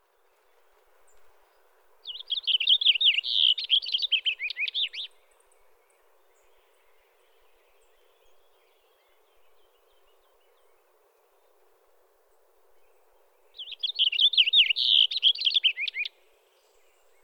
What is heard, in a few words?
Cassins Finch Haemorhous cassinii